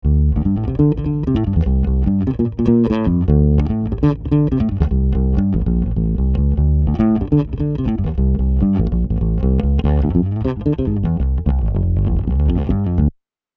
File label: Heritage Audio HA 609A Bass dry